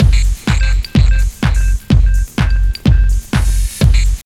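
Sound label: drum loop; X-Static Goldmine 3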